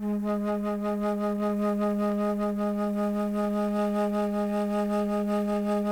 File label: CLN FLT A2, CHAMB FLUTE; Sonic Reality; Vintage Timetraveler